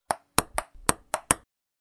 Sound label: Table tennis quick exchange